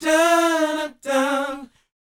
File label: BOYZ, Vocal, R&B Dance